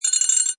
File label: Cartoon Jingle, Rattling spoons, or small metal object; Comedy/Cartoon JINGLE; rattle tinkle; Lynne Music